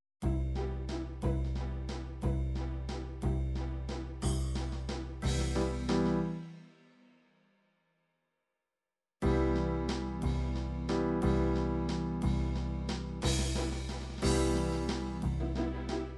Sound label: waltz, midi